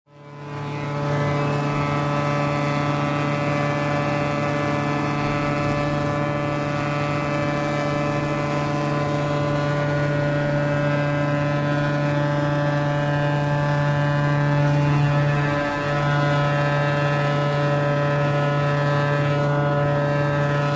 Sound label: Pulsing Buzzing, saw